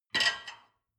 dishwasher plate grab

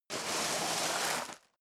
step leather shoes gravel medium slide long; schritte

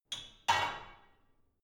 barbell only replace unsteady; sport freizeit; fitnessstudio

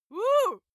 female attack

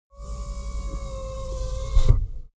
car window

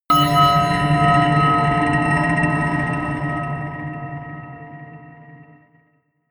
melodic anvil scrape high

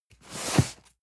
cardboard box medium slide hard; objekte

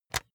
jigsaw button on; soundpool

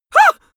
male terrified scream short; comedy-cartoon; soundpool

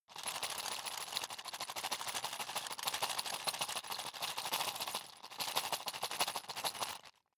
sundries rattle fast; berufe; handwerker; soundpool

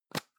plastic can lid screw open hard; objekte; dose

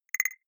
noise wood hits splutter, signale; soundpool

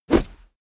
whoosh heavy fast; swooshes-swishes

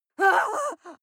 female lunatic scream fear short

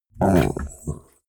chihuahua growl muffled; natur-tiere; hunde

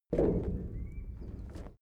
step metal step; schritte

